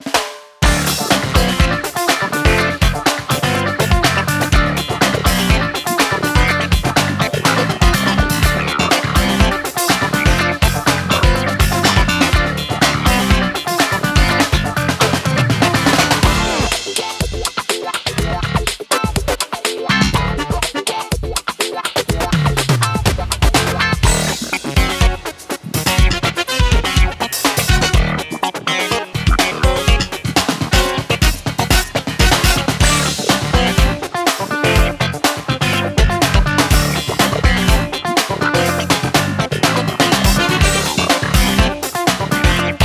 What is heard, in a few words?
Dumb Funk; Diamond Mine Productions